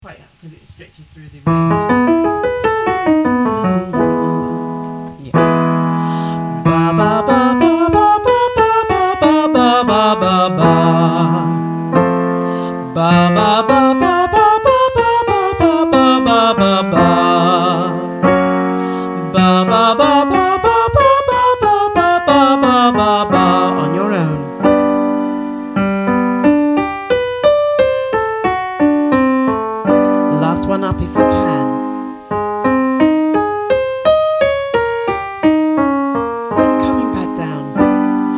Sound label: Extended Octaves